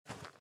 run sand; out of reach treasure royale 2020 gamerip pc

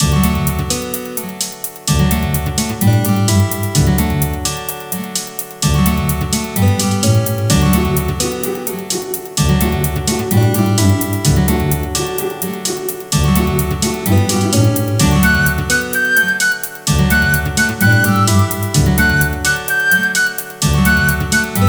MUSIC CLASSIC; Franklin's Birthday Surprise

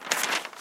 delete sound; Arc of Templar

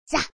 yell; Chibi Knight

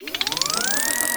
weapon spinup minigun

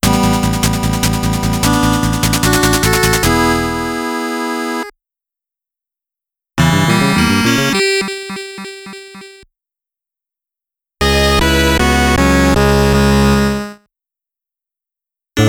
Extra Ball and Events; Alien Crush; devils crush alien crush soundtracks 2020

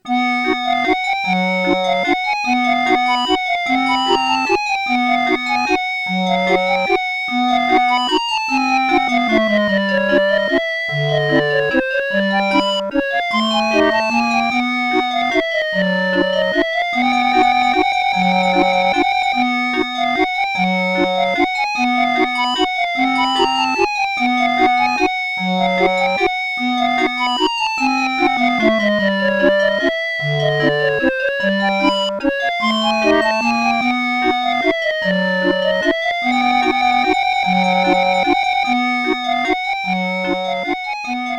Carousel Music; Crystal's Pony Tale; Genesis gamerip

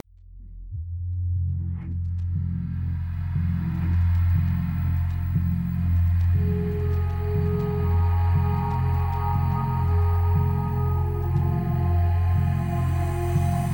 sounds music; Halo Combat Evolved; Xbox

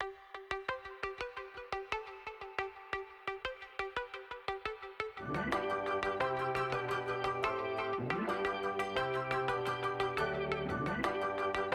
Band Piano START; barbie dreamhouse adventures

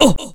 Ooh; Nicktoons Nitro; arcade

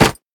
Footsteps Dirt Run

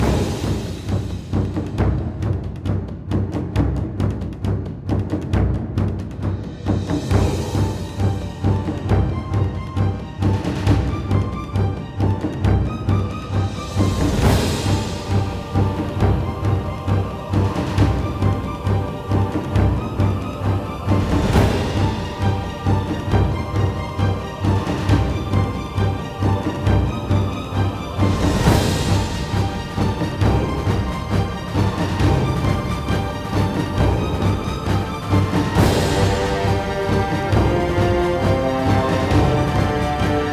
Menu music; crypt of the serpent king; ps4; xbox 360

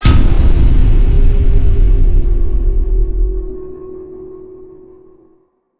Game Over Hit; Welcome to the Game II

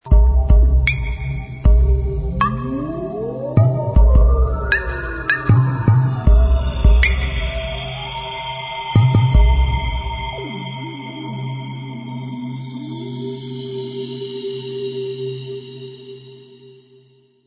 Music Theme

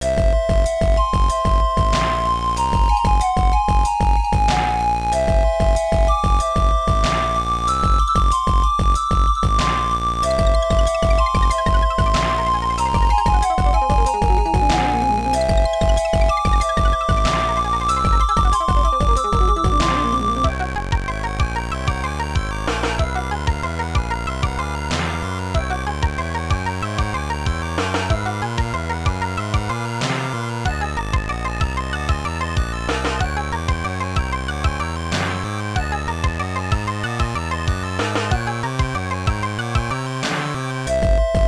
Pitch Dark Cave; Densetsu no Starfy 2; GBA

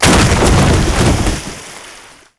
Sea Sizumu

